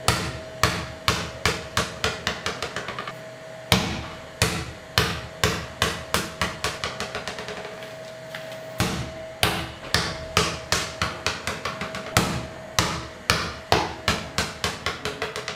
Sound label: Ball Bouncing